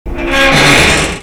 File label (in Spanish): metal sonido; ze mcbo bridge